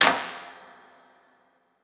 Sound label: glyph bonding; Opus Magnum